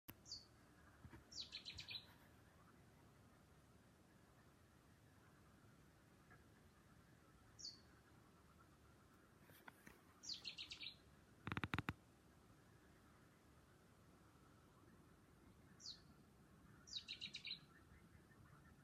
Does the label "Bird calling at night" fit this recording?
Yes